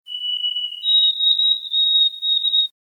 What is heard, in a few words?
bruant bunting